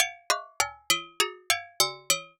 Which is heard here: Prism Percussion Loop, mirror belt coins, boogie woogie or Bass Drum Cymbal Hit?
Prism Percussion Loop